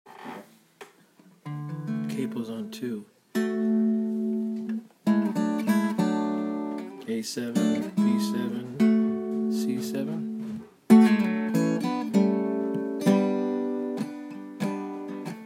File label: gtr idea 70s